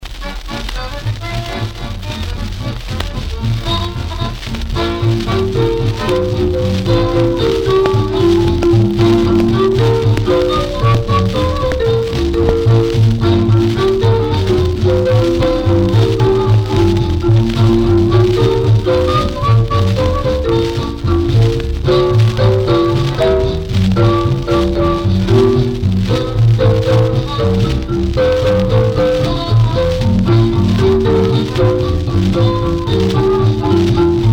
Small Combo

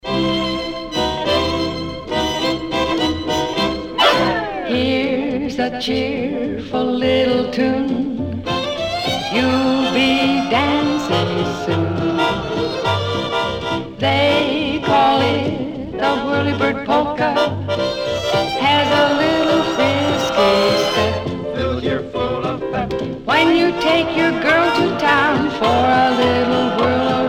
Polka

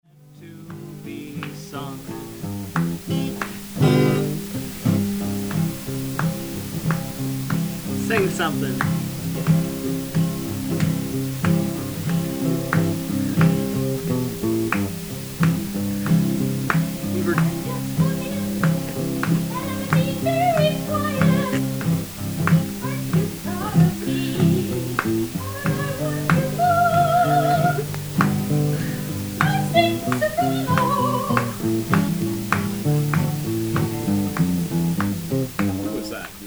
Mom Sings During an Improvisation Session

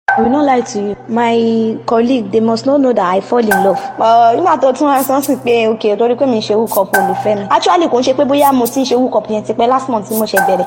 Hook Up Beat

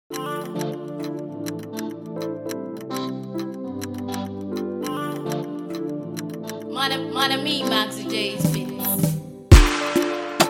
BEAT

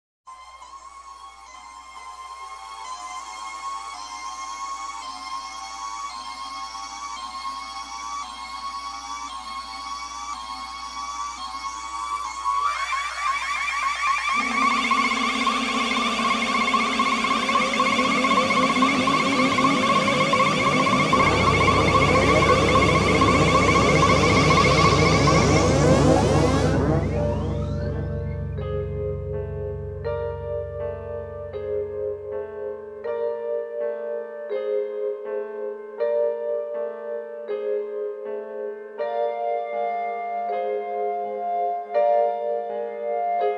Train Walking

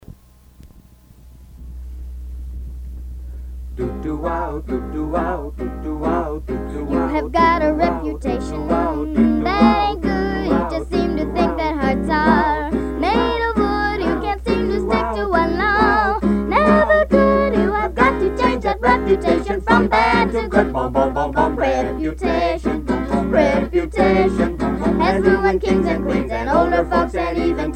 with Guitar

